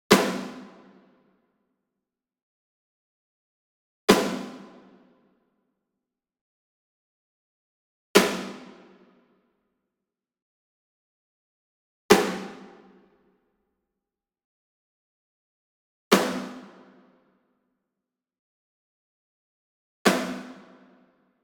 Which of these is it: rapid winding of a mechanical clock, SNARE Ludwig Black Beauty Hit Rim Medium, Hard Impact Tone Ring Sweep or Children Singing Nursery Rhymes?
SNARE Ludwig Black Beauty Hit Rim Medium